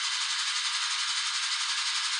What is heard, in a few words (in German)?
druckregler zischen, volvo 9900; Vehicles; AI volvo 9900; Freyfurt